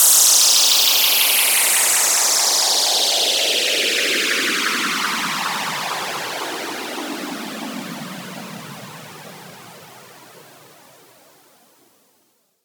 SFX Down, Dubstep; Ghosthack; Dubstep Sound Arsenal

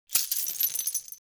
Coins Dropped; Fairlight Sound Lib

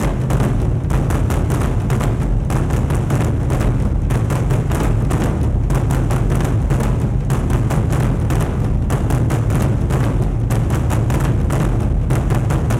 Crushed Casa - 150BPM, Percussion; Ghosthack; SkyRealm; Percussion Kits; Kit 16 - Through The Canyon

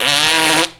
Raspberry; Vintage Cartoon; Comedy Vocals

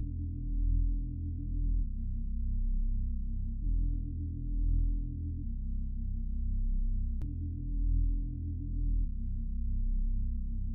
133 BPM E Min Bass, loop stems; Cymatics; 2022 Melody Collection Platinum Expansion - Pt 1; Bells

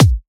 Kick, dubstep; One-Shots; Drums; Frost Kick

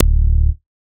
Bass D, techno synth shot; Bass One-Shots; Ghosthack; Techno Synth Shots 2025

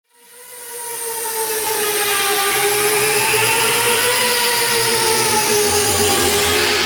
Murkiness Riser FX, Dubstep; Ghosthack - AC2024 - Day 13 - Dubstep Sounds 2025; Effects

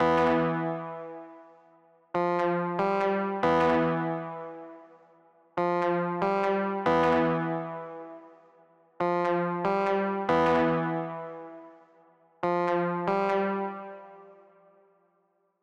140 BPM E Min Prophet 5 Synth; Loops; Loop Stems; Cymatics; Fantasy Synth Sample Pack; Heat

